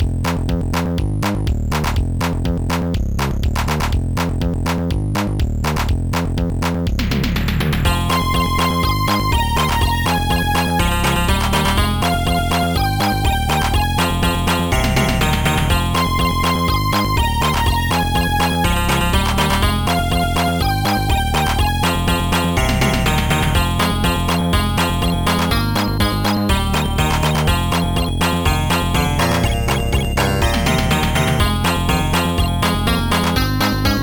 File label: funkout; SoundFX 1.3